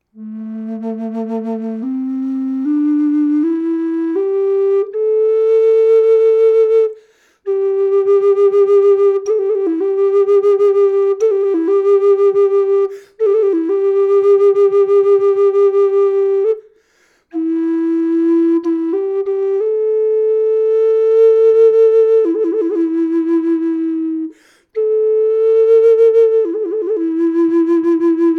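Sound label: natural, flute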